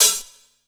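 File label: open hi-hat, Drum & Bass, 160 BPM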